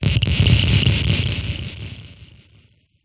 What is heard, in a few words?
Blasts Synth Delayed Oneshot; sound spark